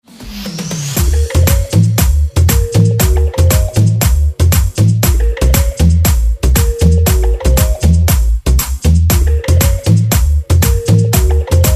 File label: Latin House Redrum